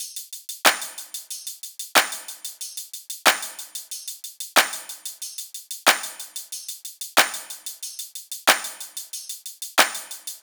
top loop, Drums, Loops, Top Loops, Pop; Slate; SD PASADENA Pop Sample Pack

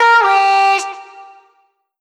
Omnivox oneshot Gm, Vocal; One Shots; Slate Digital Omnivox Vocal Sample Pack

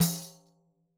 daf Single hit; Raw Perc One Shots; Slate; SD SEISMIC Drum Sample Pack

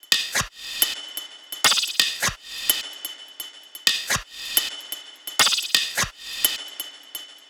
SD SEISMIC perc Nantai 128, Perc Loops; Slate

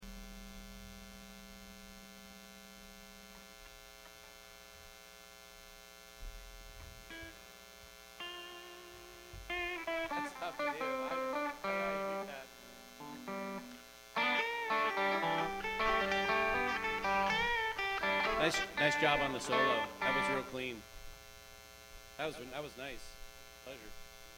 Buzz and Feedback Mix

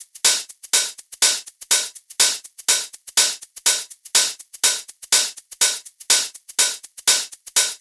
hats 123, Hat Loops; drum sample pack; Kelut; SEISMIC; Slate

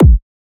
kick knot; Drum Sample Pack; One Shots; Slate; SEISMIC

